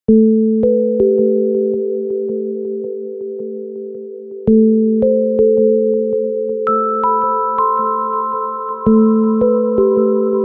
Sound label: iphone 4gs sms ringtone